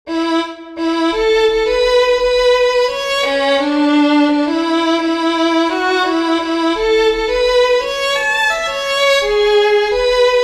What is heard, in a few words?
JANE ANDREWS SLOW AIR, fiddlers; southern kings fiddlers